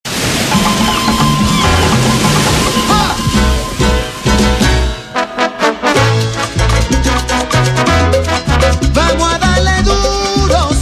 Chanson Salsa

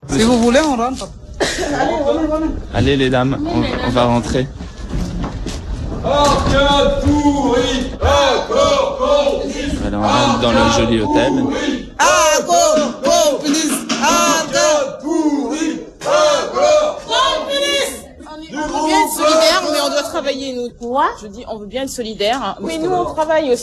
Greve ACCOR manif

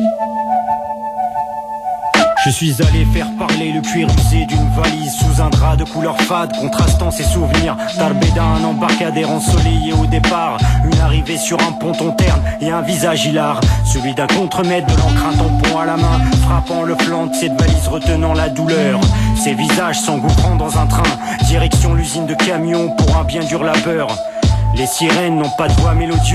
Chanson

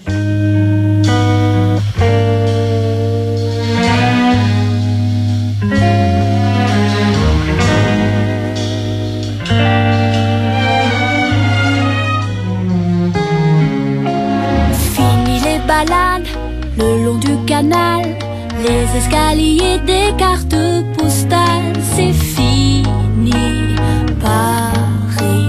Chanson

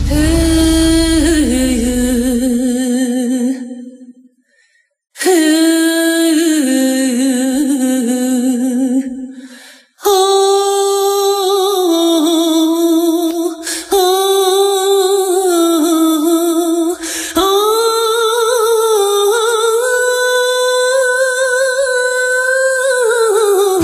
Chant arabe avec tracteurs

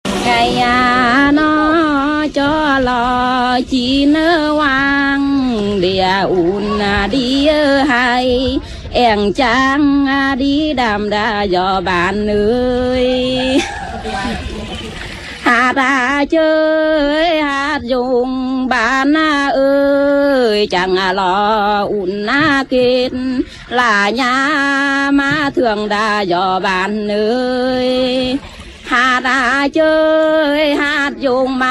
Chanson vietnamienne

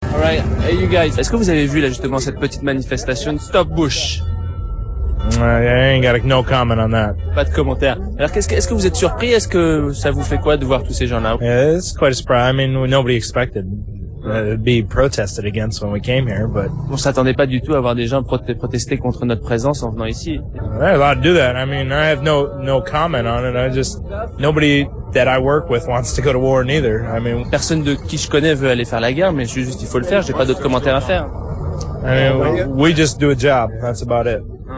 Manif stop Bush soldats US

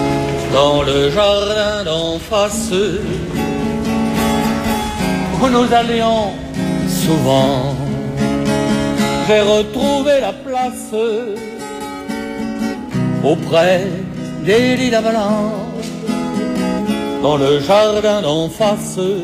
Chanson francaise

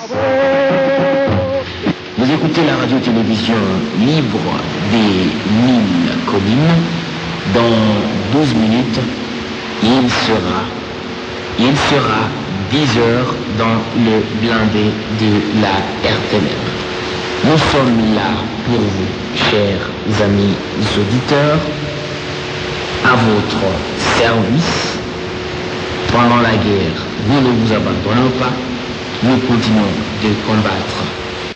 Jingle Radio; Mille Collines

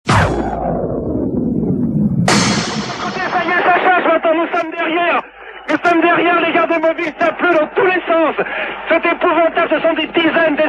Manifs ambiance mai 68

